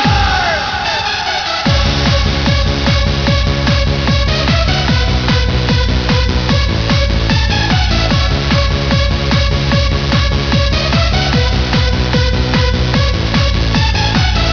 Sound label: techno; cstrike; ambience